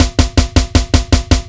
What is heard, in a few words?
Blastbeat